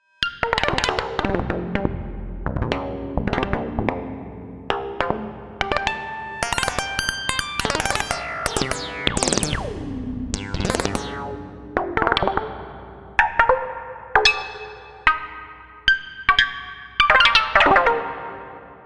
Plucky Patch

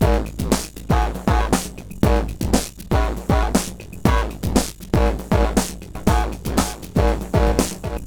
drumloop, Evolver; DSI

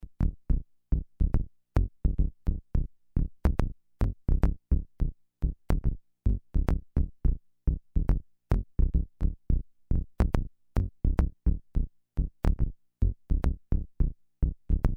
click bass

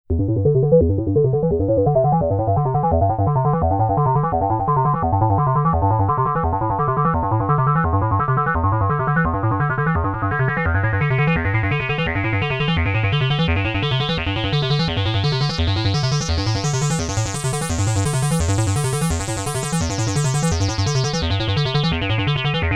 vcs3 dry; Dave's Poly-800

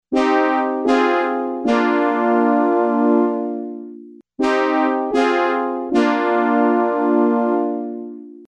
horn, CZ-101

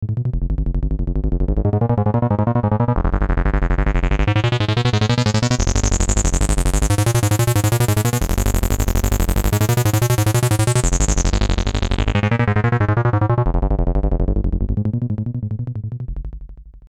boogie; wiard

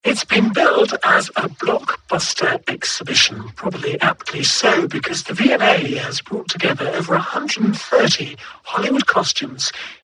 vocoder

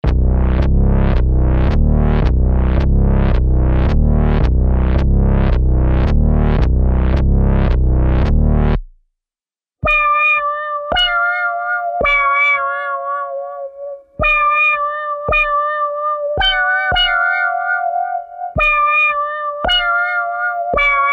EX800 Patches; sound design was my main concern. Only effect used is a delay at the end of the last patch; Korg

